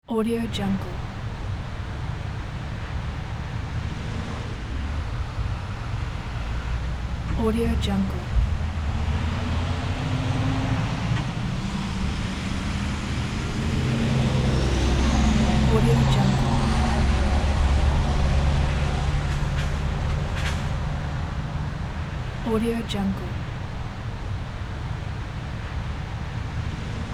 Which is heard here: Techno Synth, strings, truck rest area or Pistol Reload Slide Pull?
truck rest area